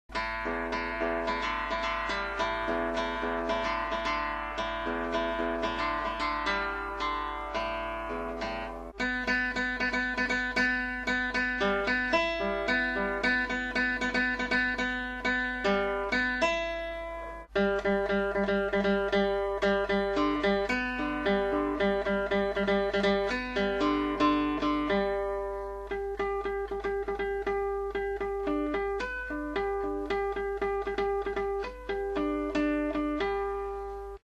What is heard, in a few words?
Guitar echo; Jolly Guitar; Musikverlag Zimmermann